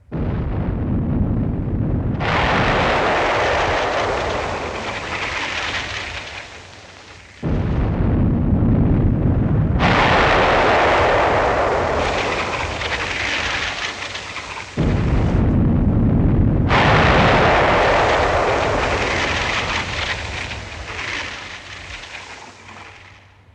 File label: Volcano Explosion With Debris And Lava 3 Versions